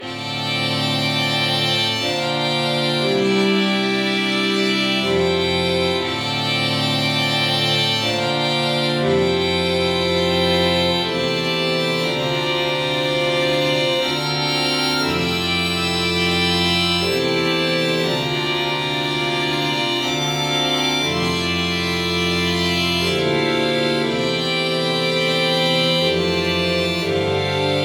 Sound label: chord sequence